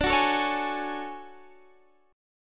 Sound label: D Major Sharp 11th D Guitar Standard; DM#11; strummed; x,17,16,14,14,16; down; capo=9